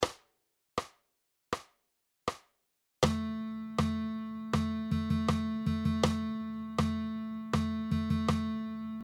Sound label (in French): Dictées de rythme, guitare